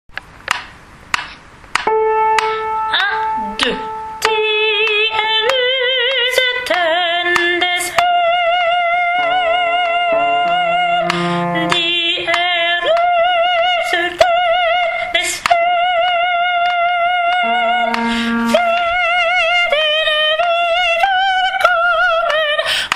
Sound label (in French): requiem allemand soprano; Brahms; suite mesure 254